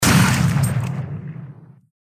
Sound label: Boom Sound Monster Die Money